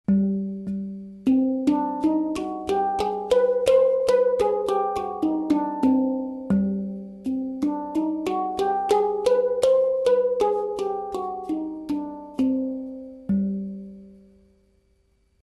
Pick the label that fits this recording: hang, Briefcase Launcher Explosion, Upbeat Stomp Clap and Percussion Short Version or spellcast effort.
hang